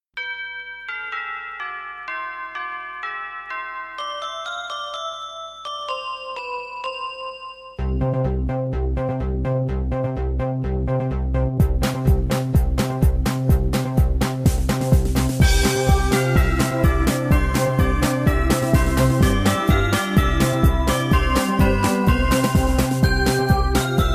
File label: Deck the Halls instrumental; Christmas song 4th level